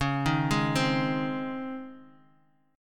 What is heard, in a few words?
Db Major 7th Suspended 2nd Db Guitar; arpeggiated; JGuitar